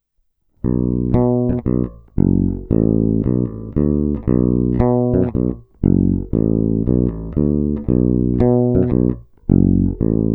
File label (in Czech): Snímač u kobylky (FW), Mike Lull V4, baskytara